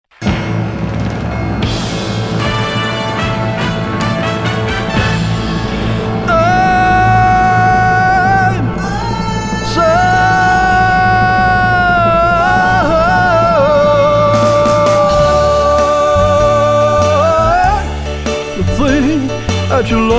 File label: Impersonator